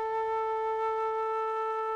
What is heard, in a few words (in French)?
la440 flute trav